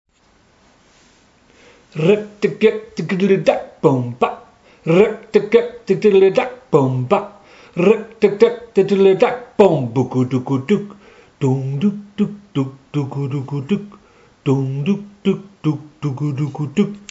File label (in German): einstigsbreak olodum kurz, samba